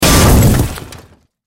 Impact Crash Metal Bang Processed Large Hit; Crashes; Sound Effects:Objects; Blastwave

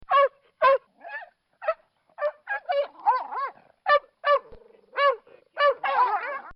Dog Fight; Dogs; Animals; recorded in a custom built sound booth; Bobby Cole

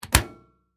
Breaker Switch Off, 400, Amp; Industry, Breaker, Switch, Off; Machinery

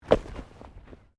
Body movement Impact Roll

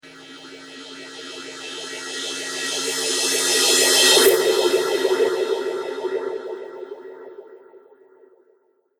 Reverse Cymbal Phaser

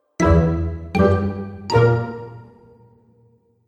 Cartoon Fun Musical Element

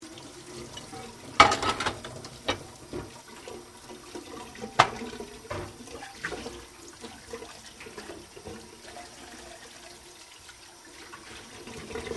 Washing Dishes Running Water

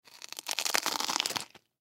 Human Bite Food; Bite Food Crunchy Stale Food; Eating; Blastwave

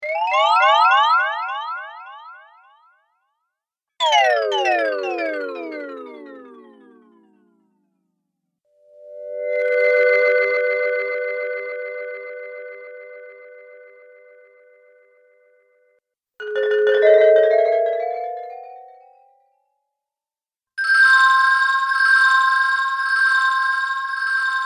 Rpg Magical Spell Collection

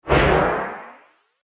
Bounce The Whoosh

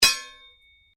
Strike Metal On Metal Heavy Ring; Foley sounds for melee weapons; Materials

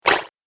App Game - Sticky Splat; Splat Sound Effects

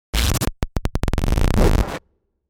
Glitch Static Rip Blip; 5.1 STEREO MIX; Blastwave

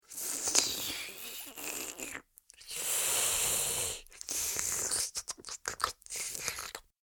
Vampire Drinking Blood; Monster Sound Effects; Horror Sound Effect

